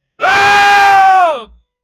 roman soldier scream

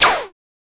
pixel art attack but more

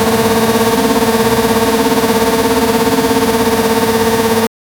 fpv drone flying 5 inch

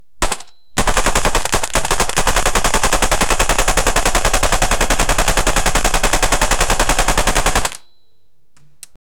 automatic rifle firing

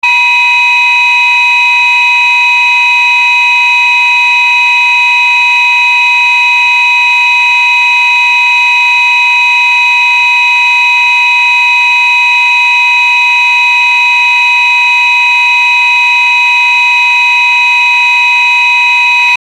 an alarm noise; safety

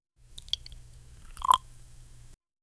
soft fizzing of a carbona, beverages

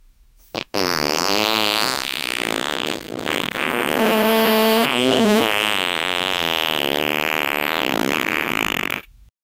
fat man farting; human body

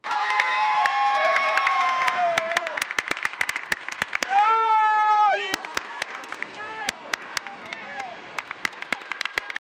claps at football field

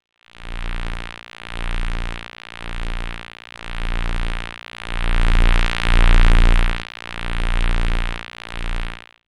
8 bit spawn sound

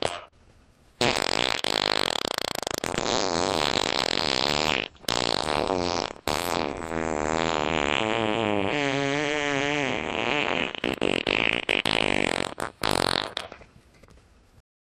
really disgusting long co, body

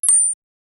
cyber unlock blip

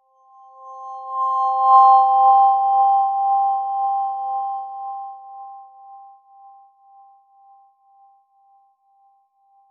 haptic vibration sound

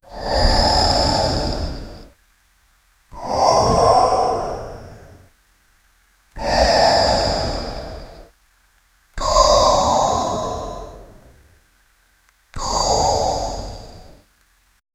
the massive rustles of an, angel

